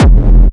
industrial techno kick oneshot - f, Nicely shaped, crispy and reverbed industri; kick drum